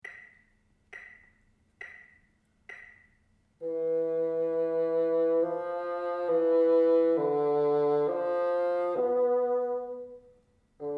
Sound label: teacher, bassoon